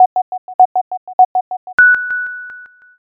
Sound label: jiho